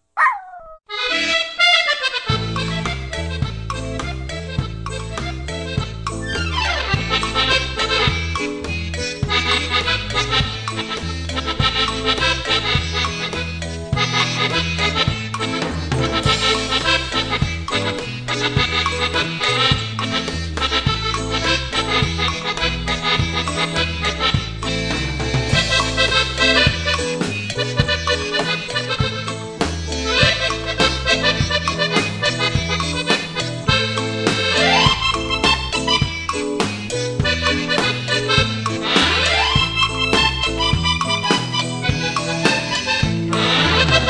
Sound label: Cumbia; latin